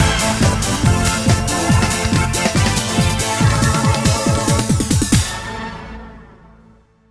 Goal; GT Pro Series; Wii

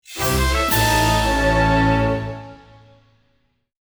Fanfare; Legend of Zelda The A Link Between Worlds Sound Selection; Hero Mode Fanfare

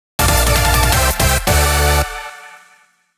Goal; 超チャリ走

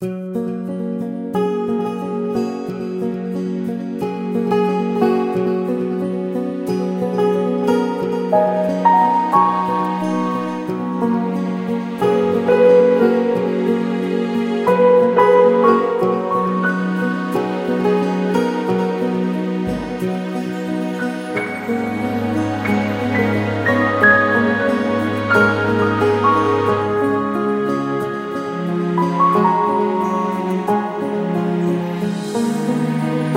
Music Menu; Tapventures; Android

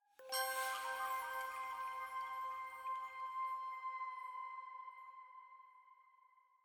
Jingle Flute Chime C

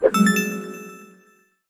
Event Lucky Appear; Taps to Riches; Android

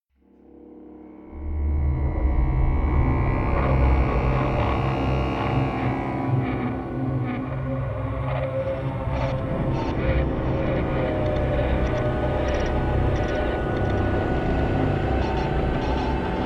Music Action Scary No Rhythm No Strings; starcraft 2 wings of liberty; MissionMusic

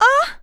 Female Surprised; Lucius II The Prophecy

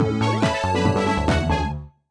Success!; e-Reader

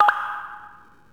Popup Open

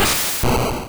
Low Kick; Pokemon; Gen 1; attack moves; RBY

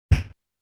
Dragon Body Blow (Large)

